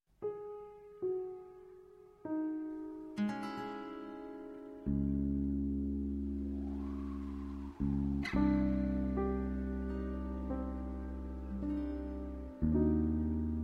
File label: mystery rain